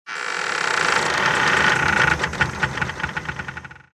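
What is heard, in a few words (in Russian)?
скрипит как пружина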